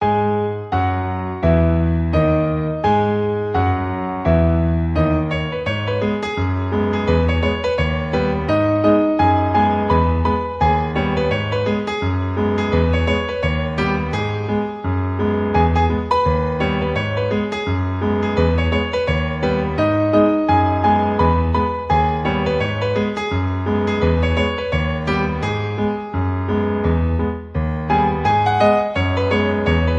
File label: Piano Cover